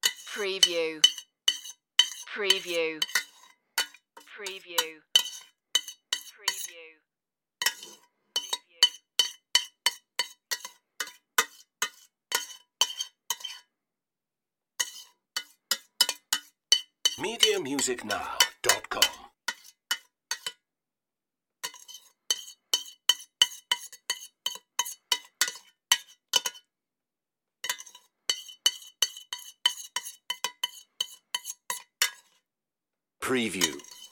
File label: scrape out kitchen pot